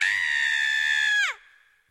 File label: bunny scream; babs bunny; dots quiet time fx